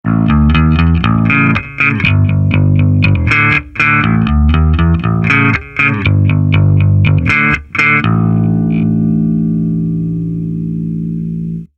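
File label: Fame MM 400, guitar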